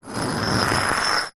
brick scrape on smooth concrete; foley

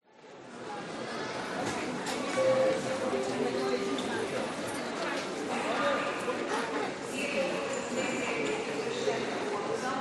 Netherlands Train Station; audio hero

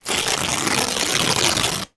wrapping paper rip; foley; zapsplat

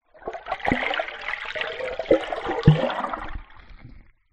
water underwater movement; nature; zapsplat

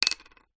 foley bottle top metal down on wood surface

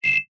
multimedia toggle metallic; zapsplat

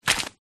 footstep single walking wet sludgy mud; foley; zapsplat

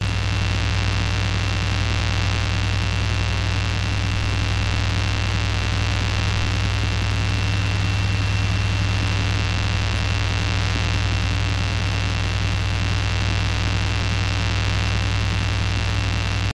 Hybrid Electro Magnetic LOOP; PMSFX

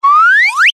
cartoon slide whistle ascend climb very fast

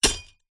impacts metal hit small stones

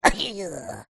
cartoon male sneeze

